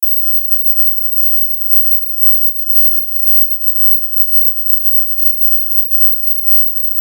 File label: screech, mosquito ringtones